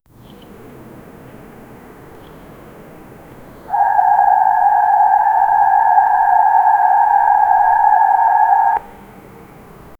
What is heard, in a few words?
megaloceros sound, deer